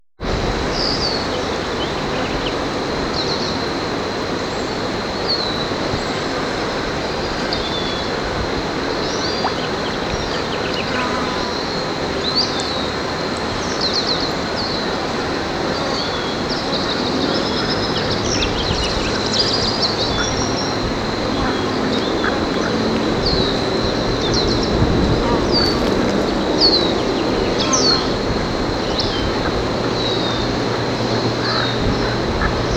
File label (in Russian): u pruda v vetrennii den; zvuki prirodi